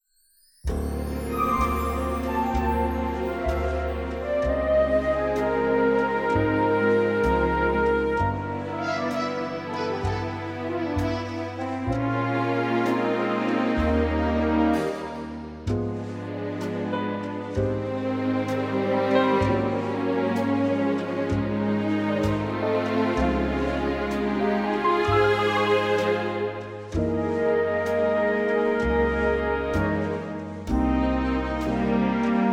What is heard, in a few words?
Ab orchestral